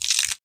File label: splash; water splash slime sfx